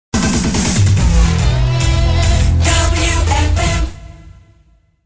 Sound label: Jingle (Drums); radio; 1990 WFM